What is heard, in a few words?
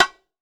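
bongo high, GM percussion kit; AKAI S-Series CD-ROM Sound Library VOL-5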